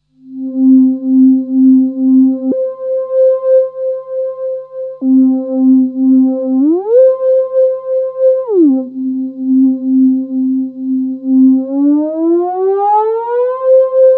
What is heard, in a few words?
slide